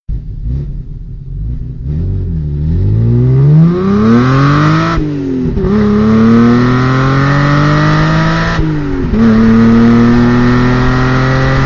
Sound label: Bmw M5 Start